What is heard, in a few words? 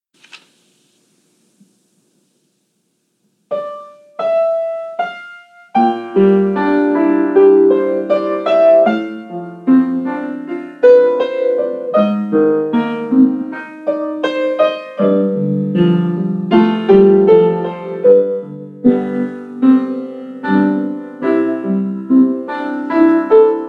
Piano; Danny Boy; Rosemont Ridge Middle